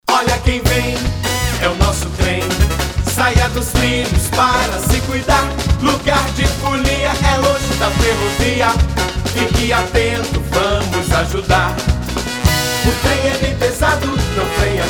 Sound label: Jingle Carnaval; Carnaval 2016